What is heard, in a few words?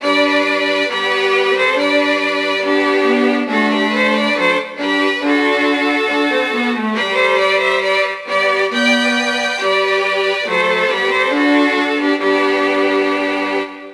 4 Violins